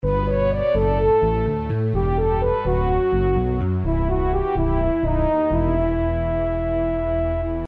game over; gameboy; bionic commando

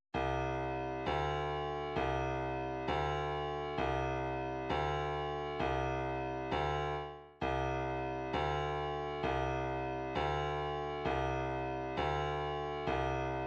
132 snare, midi; Udology

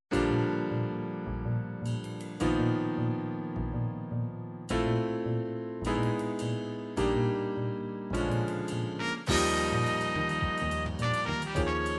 jazz

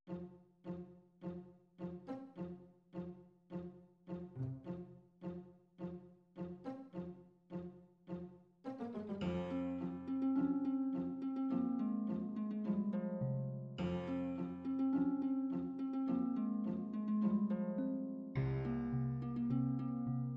xylophone; Game System Super Nintendo; Ultima VI

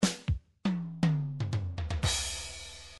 midi drums drums fill

